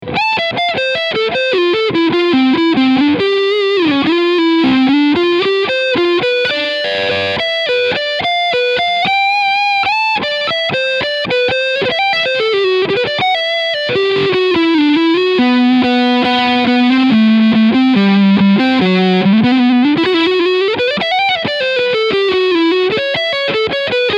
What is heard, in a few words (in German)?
Leadsound Front Pickup; Ibanez ATZ100 SBT